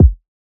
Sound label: Kick